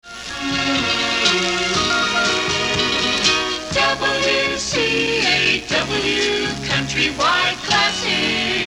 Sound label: jingle Country Wide Classic; Station Identification